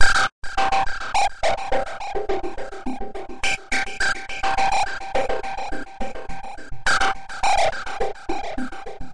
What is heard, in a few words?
ringtone in space, 105, synth and electronic loops